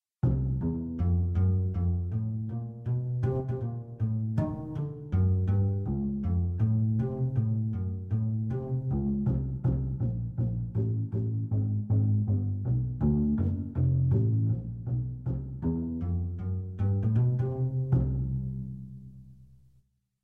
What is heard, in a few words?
Upright Bass; sibelius sounds